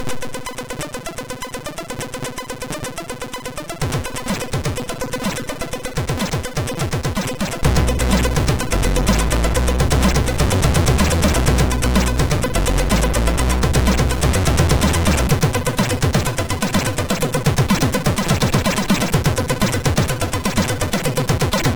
sid MOS6581; Love Spy

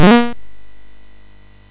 Dragons Den, MOS6581R3

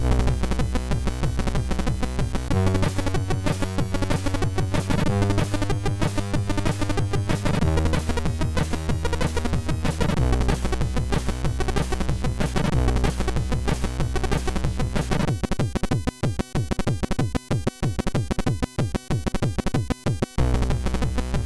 Intro, MOS6581; MOS6581R3; hvsc

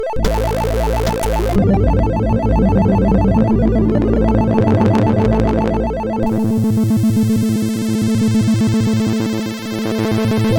Menu, MOS6581R4

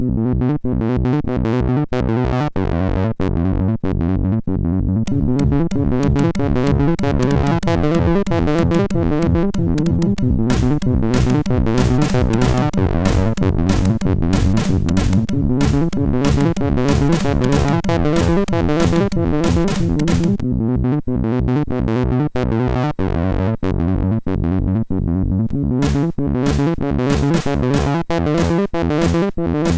MOS6581R4

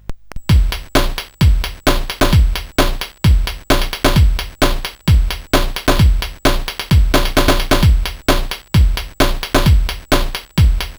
Rag Beat 64, CSG8580R5

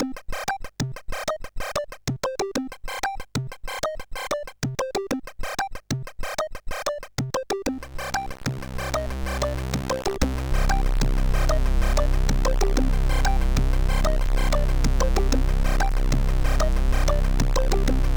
Smack it, CSG8580R5

